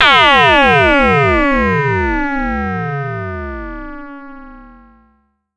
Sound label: Pollard Syndrum